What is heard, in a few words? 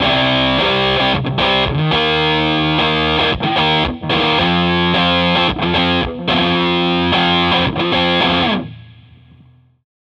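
110 C Red Electric Guitar End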